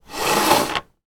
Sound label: toy plastic building brick structure slide across table